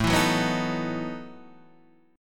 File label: A 6th Suspended 2nd Sharp 11th, guitar; 5 7 4 4 4 5; strummed